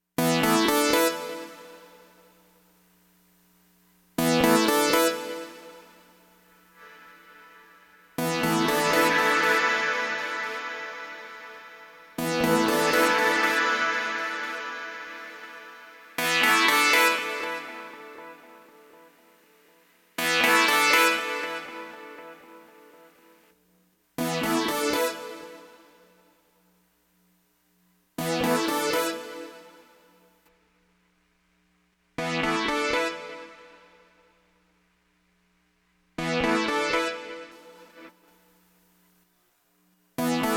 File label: Synth; UJAM FINISHER NEO